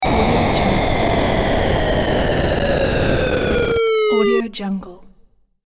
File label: hyper space arrive long; sonic-boom